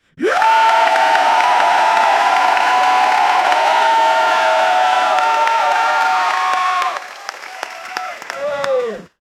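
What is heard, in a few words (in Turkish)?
10 saniyelik takım motive, cheering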